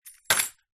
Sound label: household keys bunch drop wood floor